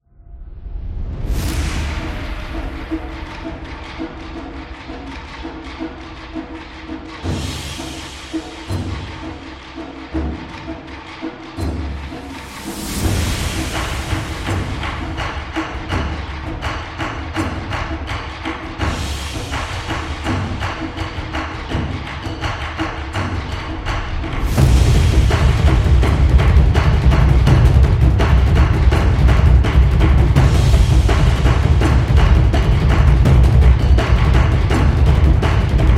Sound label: Taiko Japan